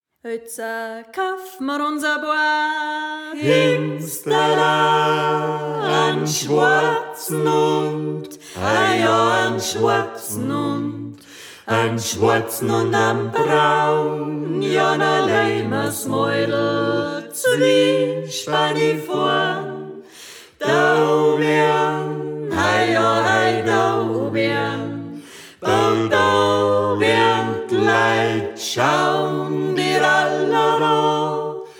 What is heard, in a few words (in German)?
Iatza kaaf ma uns a Paar Hengstala, volksmusik